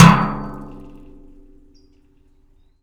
hard hit, rust kit; dry; Nucleus Soundlab; Ambient Drums

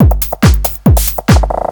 hard beats 140, hard trance beats

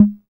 CR-78

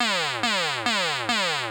SPACE FX 140; SpaceSounds

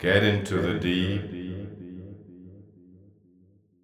deep house male, 125, A, vocals

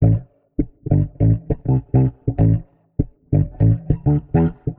Warped Bass, 100 A; Loops; Trugruve; Touch Loops; CosmicFunk

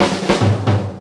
JAZZ FILL, JAZZ DRUMSET

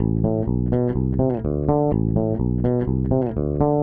DEEPHOUSE BASS 125 A

